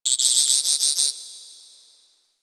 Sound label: Ocean Critter Insect; Ambience; Warframe; Grineer Ocean